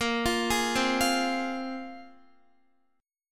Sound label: Bb 7th Suspended 2nd Bb, Guitar 4E 4A 5D 5G 5C 6F; Bb7sus2 arpeggiated; x,13,15,13,12,12